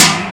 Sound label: WATER, INDUSTRIAL